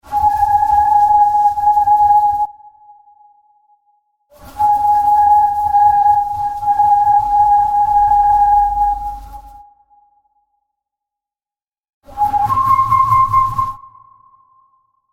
Sound Hose, Oscillating sound of swirling round a plastic hose.; Strange Tones (Sound Effects:Sound Design)